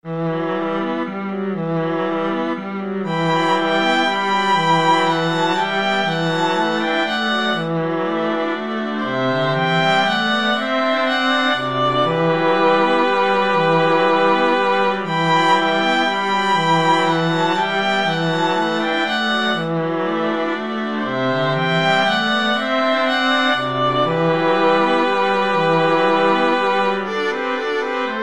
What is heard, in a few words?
spanish dances, string quartet